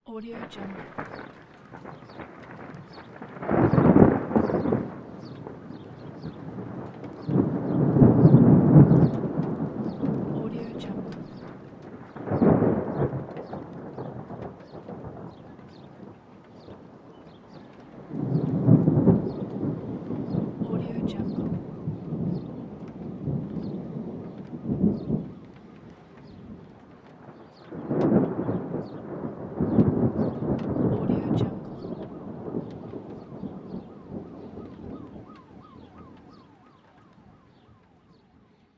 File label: thunder before rain